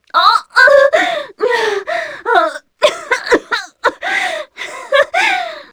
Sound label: succubus lowhealth; queen girl